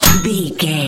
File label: bullet impact metal heavy